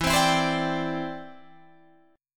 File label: E Minor 7th Sharp 5th, down, Guitar, DADGAD; Em7#5; strummed